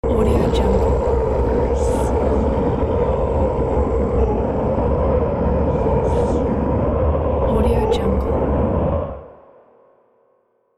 horror scream growl

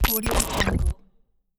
glitch

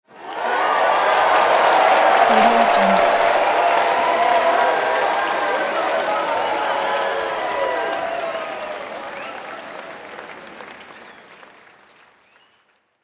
crowd cheering

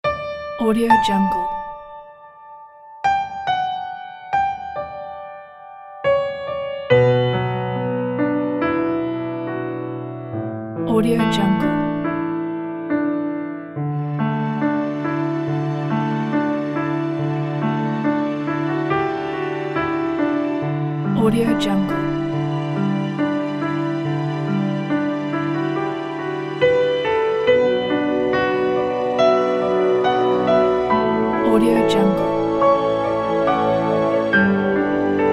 calm piano and orchestra background

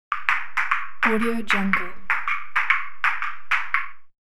ping pong rally; hollywoodedge

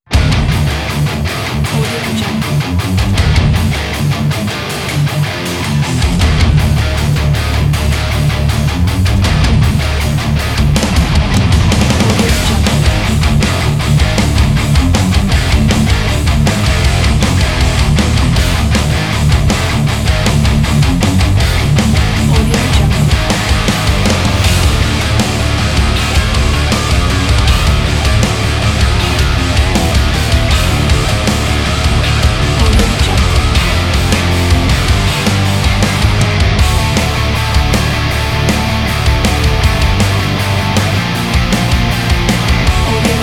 heavy power

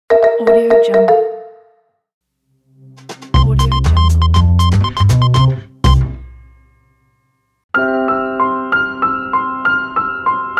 short audio identification logo pack

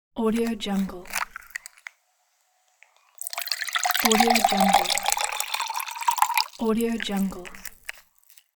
pouring

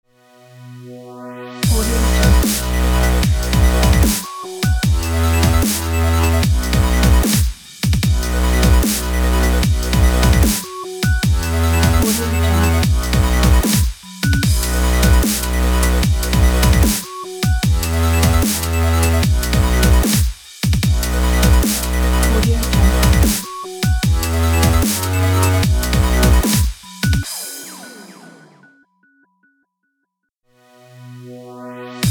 dubstep ident

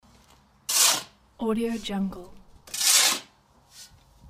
shoveling on concrete

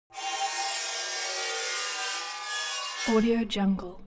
object metal scrape rich straight

